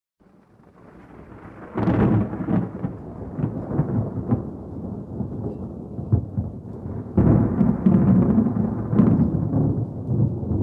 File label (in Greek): Perivallon Keravnos